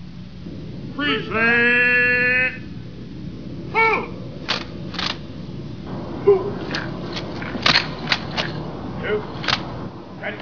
21 SALUTE

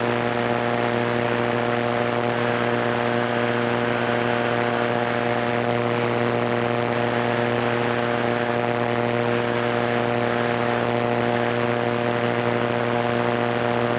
144MHz RFI

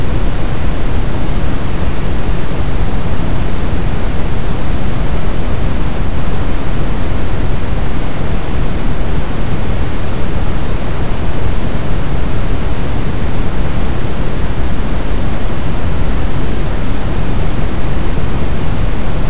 Brown Noise

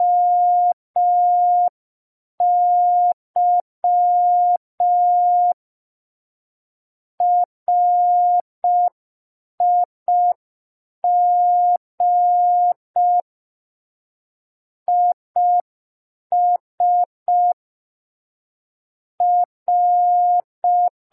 Morse code P

